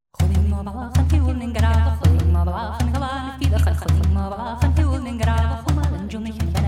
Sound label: Mouth Music